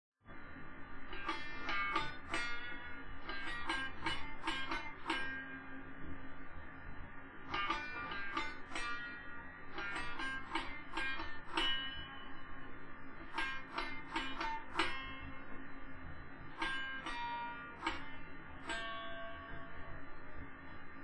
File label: chorus unknown song